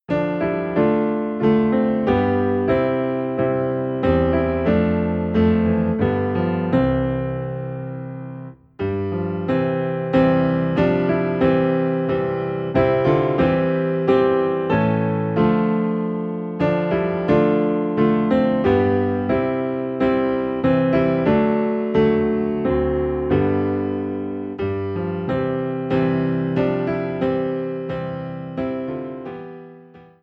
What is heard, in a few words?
Piano Low in C; christmas; Away in a Manger (Alternate)